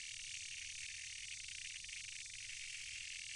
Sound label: Docile Locust Bees